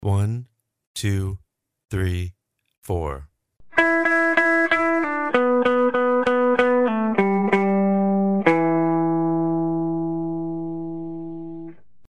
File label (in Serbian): Blues lick SLOW, Gitara